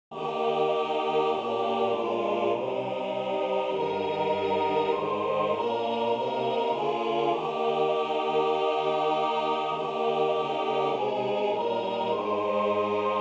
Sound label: Abide with me aah